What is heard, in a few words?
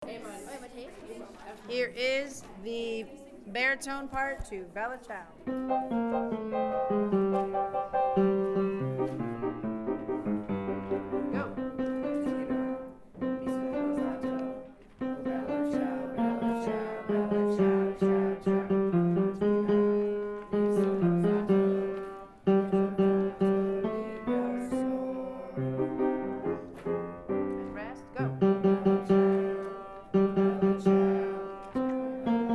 baritone Bella Ciao